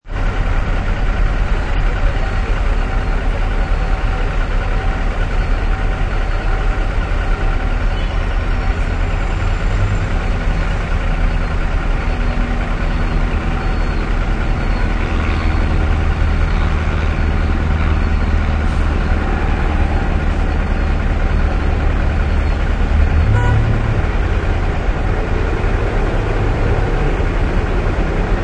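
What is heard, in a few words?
City Road Light, Busy city street; City Street Sounds; Cities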